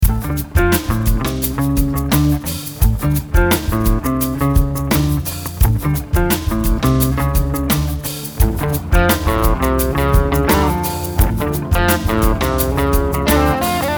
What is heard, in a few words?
Pocket Funk